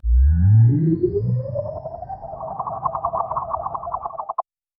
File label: spaceship exiti, cosmic